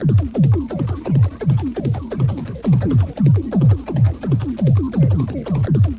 BB HAT, BLEEPY BLOOPS; Zero G; Malice In Wonderland

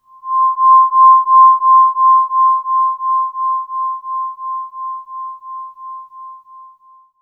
C5, Synth Line; 90 s Sample CDs; NiceBeats; SynthLine VOL-32

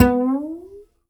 Upright Acoustic Bass

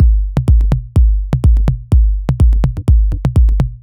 C# 125bpm, House Drumloops; Zero-G House Fabrik; 90 s Sample CDs

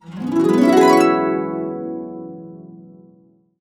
E, Harps; USB Soundscan vol.62; Complete Harps; AKAI

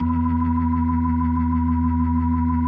E2, B3

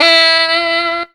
weirdo sax; Zero-G; Funky Elements

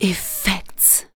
vox, Techno Trance; Ueberschall

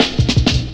Beatle Cut, Jungle Drum Loops; Zero-G Jungle Warfare 1, 2 & 3

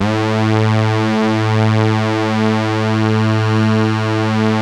GLIDE, Synth; InVision Interactive; Keith Emerson; The Most Dangerous Synth and Organ

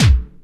KIT, Dance Mega Drums; Best Service

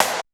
SN, SNARES; USB Soundscan vol.20; Fresh Disco House I; AKAI

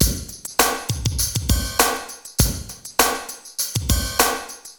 SHORT SWIN 100, LOOPS

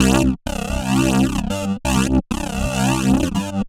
Slipslide, Bass; Sonic Foundry (Sony Creative Software); Underground UK House & Electro

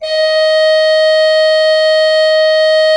D4, Cathedral Organ, HOLZGEDAKT; Propeller Island

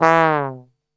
E 1 TRB, Brass Super Section; Best Service; 90 s Sample CDs

